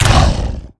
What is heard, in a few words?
fall pain